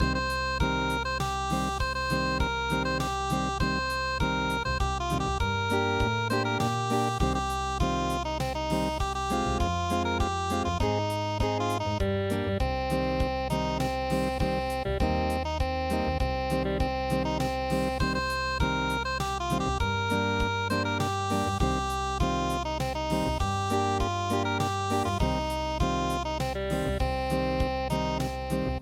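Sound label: miryang arirang unplugged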